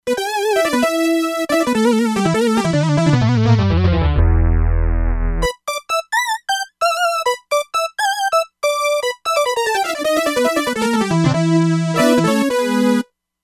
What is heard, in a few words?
Saw Bass-Lead; Factory Presets; E-mu Proteus-2